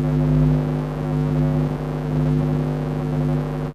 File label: Misc Noise